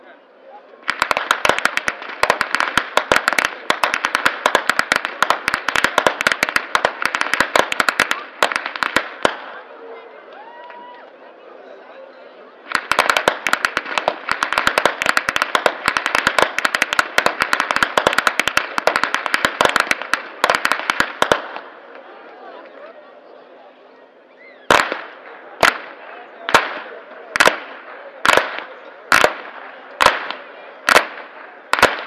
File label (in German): schnalzen, flachgau